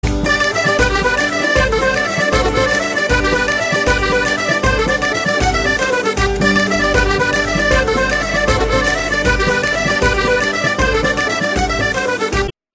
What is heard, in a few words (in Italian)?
Tarantella Montanara